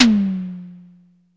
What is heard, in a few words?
Drum X Tom; Pearl Drum-X